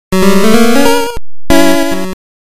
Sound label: Lose a Life; the flintstones gb 1994